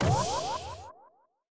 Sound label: spin Button Click; spacewars